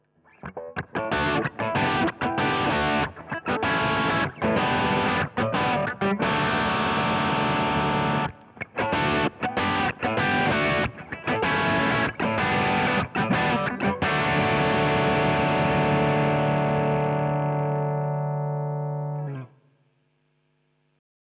JHS Prestige Marshall Boost

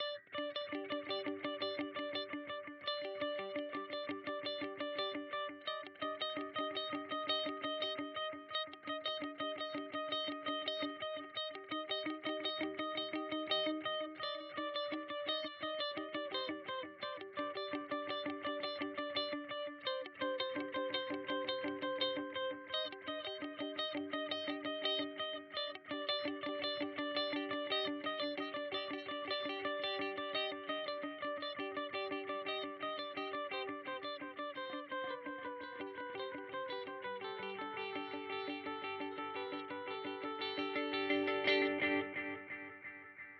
Way Huge Supa Puss

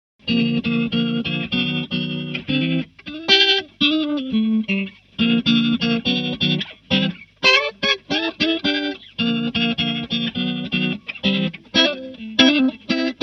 leslie

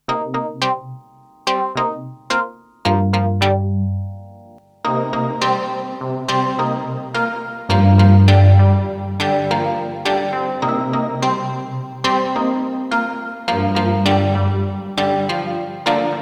Lexicon PCM70 Rich Plate Synth

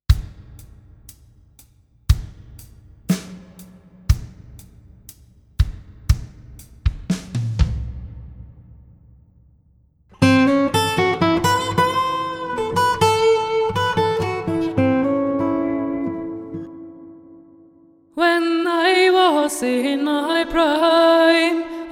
Plate B1

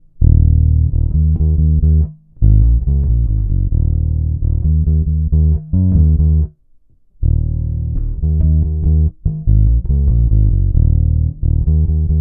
Neck bassboost hicut

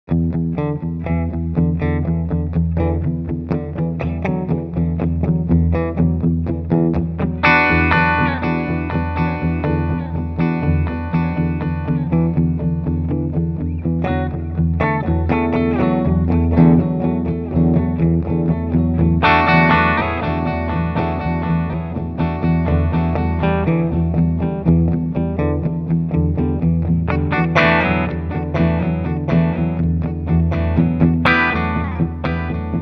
Tape Echo

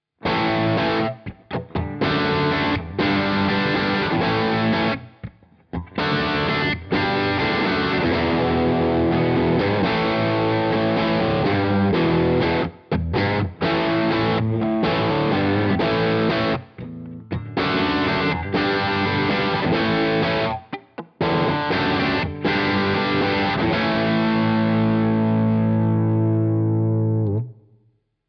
Headrush Core Brit 800 Stack

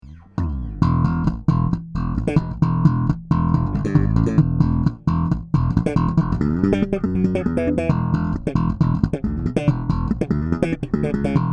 Marleaux passive Slap

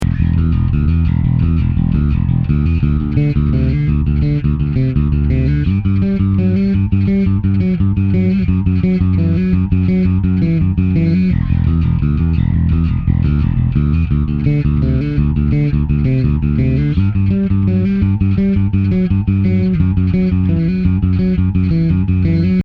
TECH 21 DP 3X

